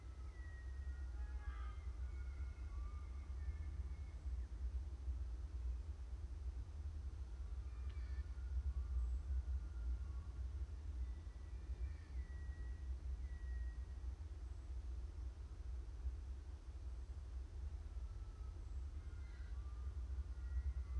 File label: Dark Forest Ambience; Cave SFX